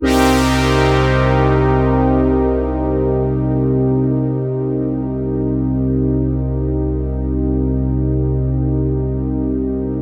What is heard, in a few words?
SY BRASS MIN, Synths